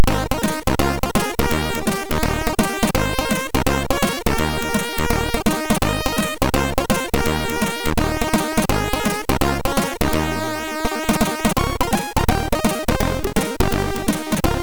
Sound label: Sweety Jingle, MOS6581R3